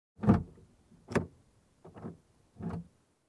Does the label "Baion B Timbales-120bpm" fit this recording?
No